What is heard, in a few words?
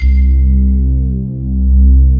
MALLETS; Pro-Rec - Synthsonic